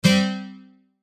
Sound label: Chime Strum; Chimes (Sound Effects:Multimedia)